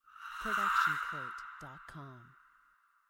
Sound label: Scary Voice